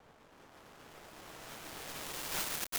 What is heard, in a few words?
Reverse Tunnel, Impulses